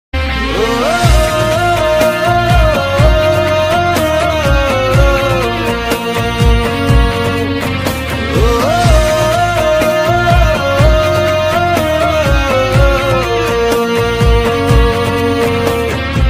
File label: songs call ringtone; radheshyam